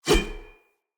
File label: Sword Whoosh; Weapons; Player; Swords; Fenn Jotar Sword